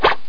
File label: scratch, rat